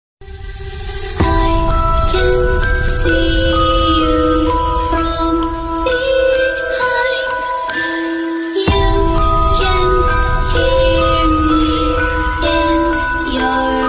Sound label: attitude ringtone bgm ringtones english ringtone